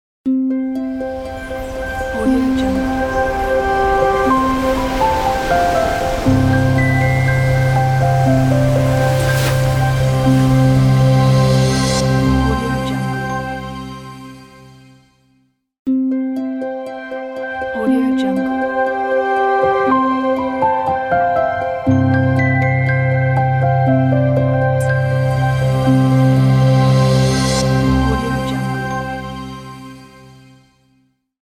nature logo

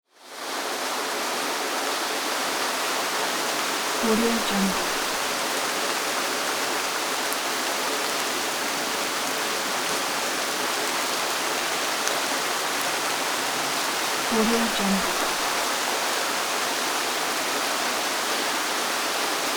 rain

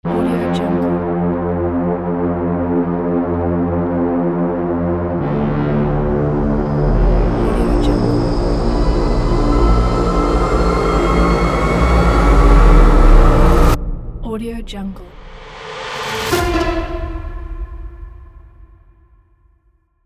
dark horror reveal

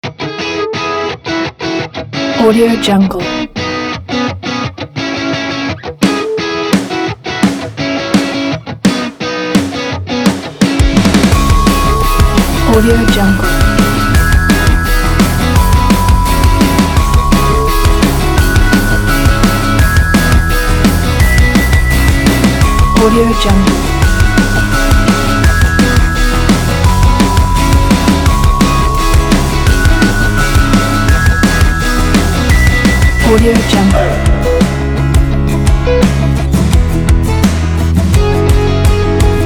energetic inspiring indie rock loop

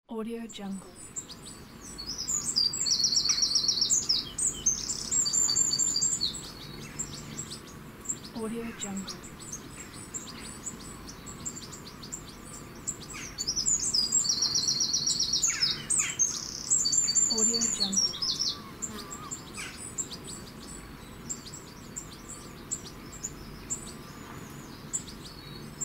birds

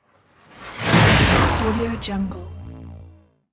whoosh spell impact movement; jbobstudio

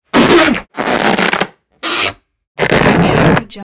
balloon stretch or rub; prosoundfx

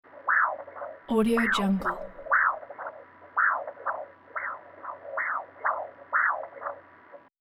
interior human heart pulse

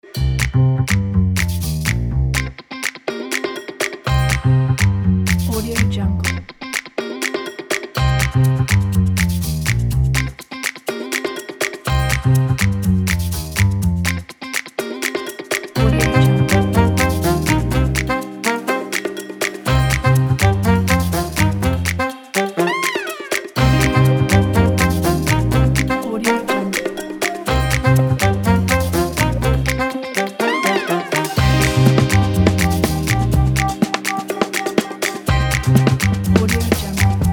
jazz funk band; colorfilmmusic

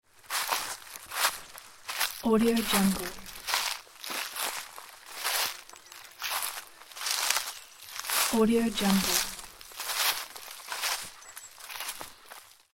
walking slowly in forest

